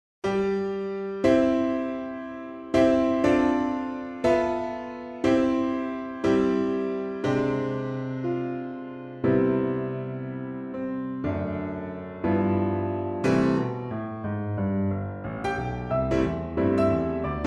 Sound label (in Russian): В лесу родилась ёлочка в 4 руки piano